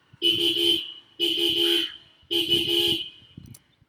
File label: Repeated Triple